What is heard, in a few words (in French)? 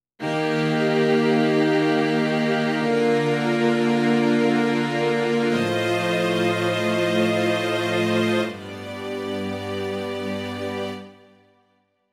son strings, korg m50